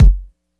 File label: perfect bass drum f sharp key; kick drum samples